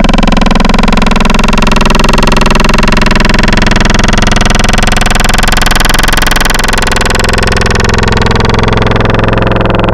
Crunch, Wavetables; Cymatics; Secrets Wavetables; Serum

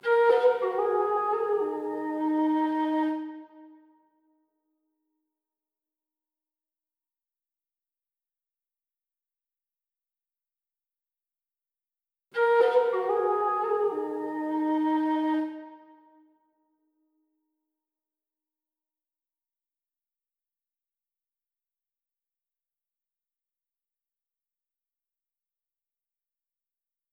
156 BPM G# Min Flute; Cymatics; Quest; Destiny Gold Expansion

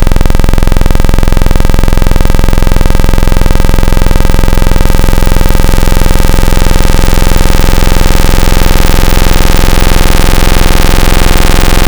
Buzzy Vintage Saw, FM Wavetables; Cymatics; Virus Wavetables; Serum

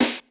Snare Hit, Bmin; Hip Hop; Hip Hop Exotica; Apple Loops; Big Fish Audio

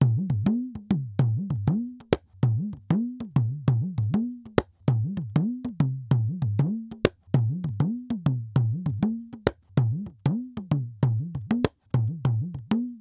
Hip Hop India 098bpm; Hip Hop India Modern Percussion; Apple Loops

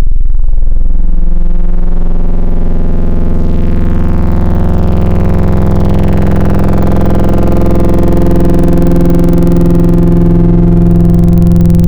Triangle Sweep, wavetables; Cymatics; Wavetables Collection Vol 3; Serum

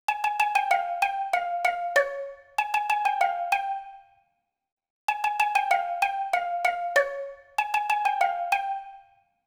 Steel Drums, 096 Cmin; Hip Hop; Hip Hop Exotica; Apple Loops; Big Fish Audio

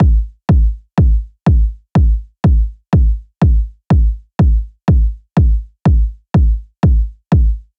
Big EDM Drum Loop Kick loop, 123 BPM; Future House; Future House Tools; Big EDM Drum Loops

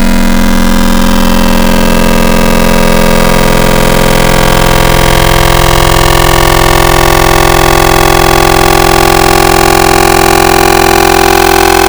Metal Fish, FM Wavetables; Cymatics; Virus Wavetables; Serum

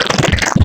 Growl Tables; Autobot; Cymatics; SERUM; Growl Tables Vol 3

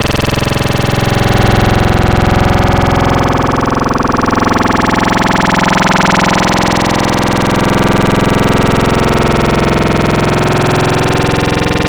Growl Wavetables; Cymatics; Virus Wavetables; Serum

